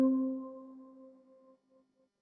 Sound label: swap